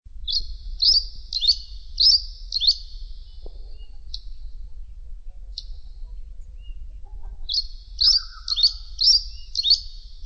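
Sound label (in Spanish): comesebo patagonico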